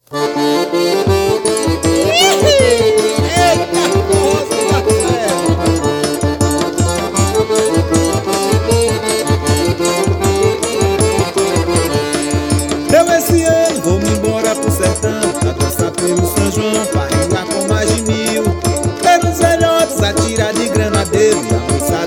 acordeon

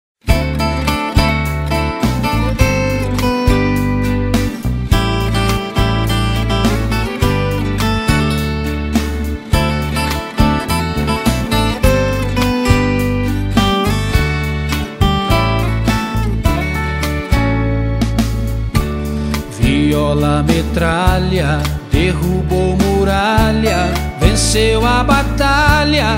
viola latina

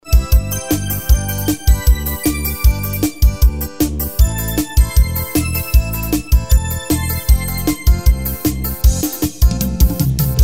balada brega

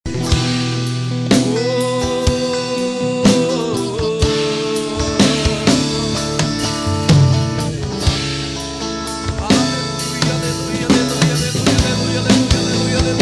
abertura

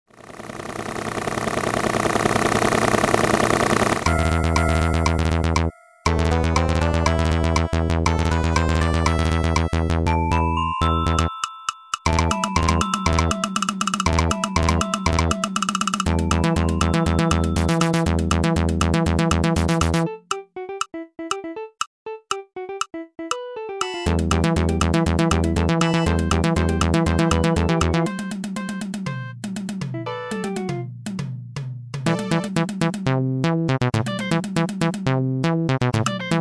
baixo solo

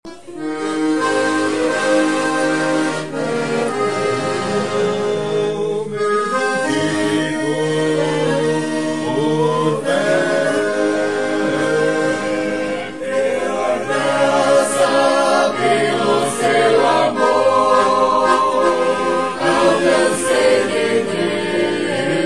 hino cantado acordeon; hino 356

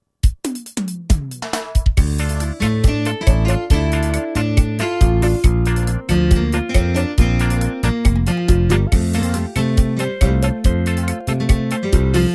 ritmos roland g70 arrocha romantico

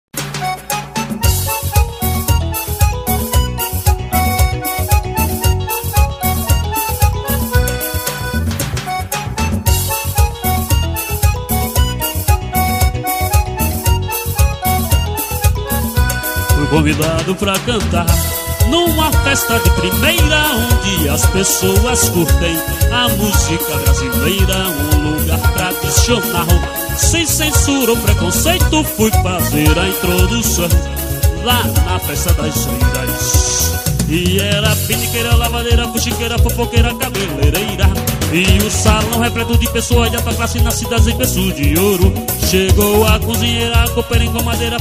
teclados; festa da eiras

